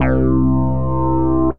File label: Mutron Bass; Synth Bass; Bootsy Mutron Bass; Ableton; Factory Packs; Beat Tools